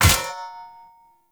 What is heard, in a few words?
Short Sword Medium Parry Impact; Weapon Impacts; Player; Swords; Combat